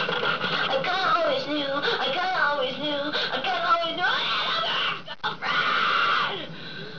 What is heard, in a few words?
Girl Scream